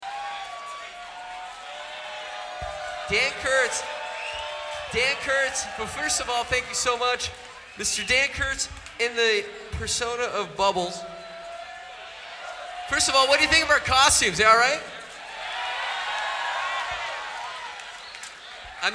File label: Applause